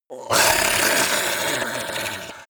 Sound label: yeti hiss slimy long; kreaturen